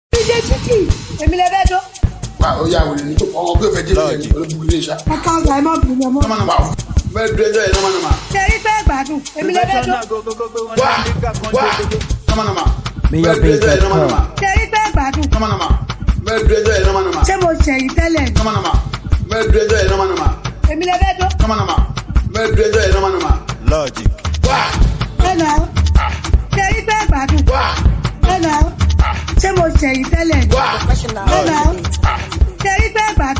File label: Professional Beat